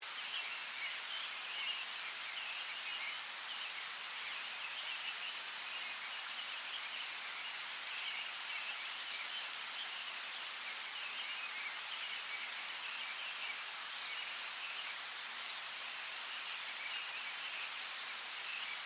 Forest Light Rain, Ambience, Forest, Light, Rain; Forests and Jungles

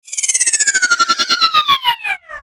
Chopper Flange Whistle Ascend Descend; Blastwave; Chopper Sound Effects

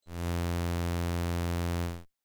Multimedia Drone Error Tone; electronic; Andrew Fleming

